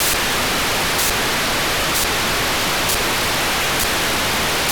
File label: 437,250 9k6 GFSK (FM) Beacon; CSIM-FD